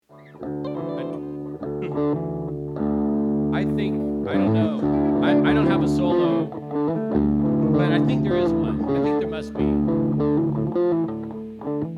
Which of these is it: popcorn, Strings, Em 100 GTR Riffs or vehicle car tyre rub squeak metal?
Strings